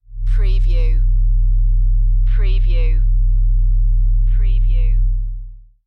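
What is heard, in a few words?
Production Element LFE Pulse; Sound Effects Bible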